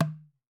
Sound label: ROSEWOOD, African Drums